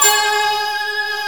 G#4 SOPRA; Northstar; The Wizard VOL-1; ORCHESPHERE 2

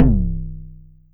XTRA PERC

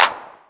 Clap, Novation Drumstation

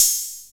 HH, Dance Kit, Roland; 90 s Sample CDs; Sound & Vision - Gigapack I CD 1; Dance-Kit 31

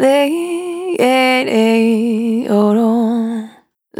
Spin Dry, Single Voice Melodic Loops

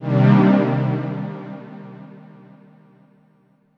Cm, Chords; M8; Electronisounds

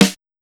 snare, Disco House; USB Soundscan vol.20; Fresh Disco House I; AKAI

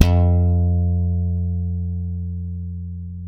ALEMBIC BASS THB F 2; Masterbits CLIMAX 7 - Bass

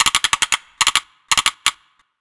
GUN TOYS; USB Soundscan vol.27

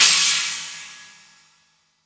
China Cymbal; Roland BK-5

